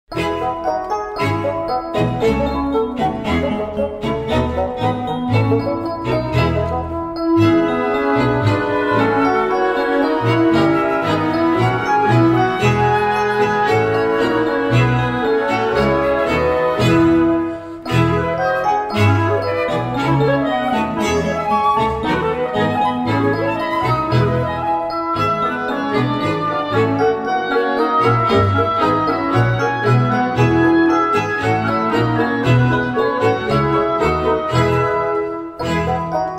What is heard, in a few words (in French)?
Dans cette étable instrumental